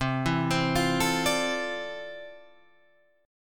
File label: F Major 13th C, guitar chord